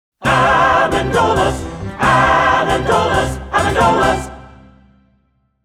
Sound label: Jingle with Music; Amendolas